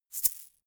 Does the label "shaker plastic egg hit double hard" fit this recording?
Yes